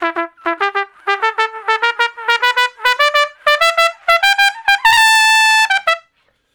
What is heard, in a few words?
Trumpet Straight (E); Crimson Blue and Fabulous Horncraft 4 RnB; Horncraft for R&B; Solos